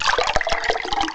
POUR; M8; CMI Factory

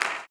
CLAP, blues & soul; ILIO; Vocal Planet

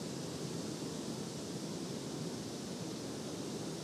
Ambi Loop, outdoor samples Ambience Loops

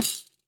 PANDEIRO

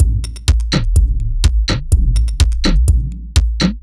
125bpm, House Drumloops

